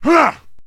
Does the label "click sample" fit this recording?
No